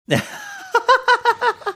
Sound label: cute laugh